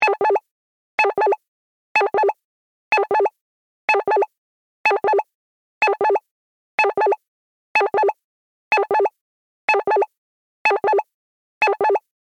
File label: Telemetry Blips, Scifi, Telemetry, Blips, Science, Fiction; Communications